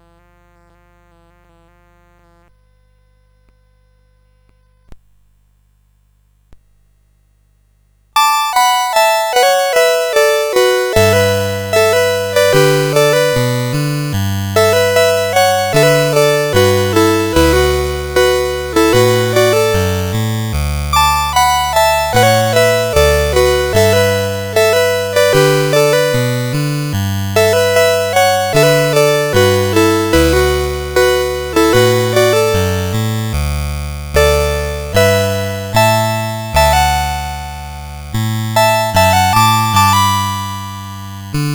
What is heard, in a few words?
First Hurt, CSG8580R5